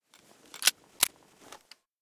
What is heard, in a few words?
fn2000 gl deactivate, weapons